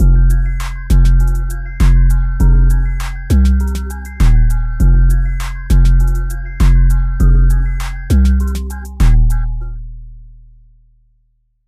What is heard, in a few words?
The bass line; 贝斯; 拉丁陷阱音乐